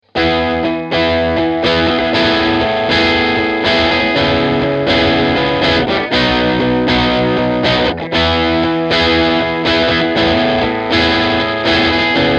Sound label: keeley mod boss ds 1